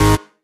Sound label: Synth Cuts; Vengeance Samples; VES1 Instruments